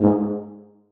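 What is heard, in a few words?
tuba staccato g2; Sonatina Symphonic Orchestra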